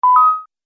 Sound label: Multimedia Event Notification, beep sound effect